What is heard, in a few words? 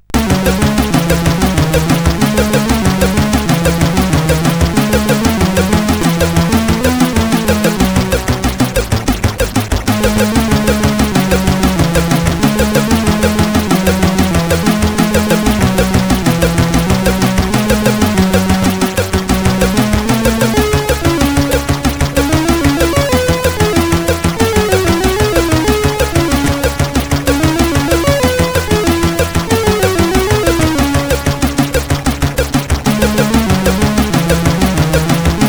Noise Part sid CSG8580R5; Noise Part tune 5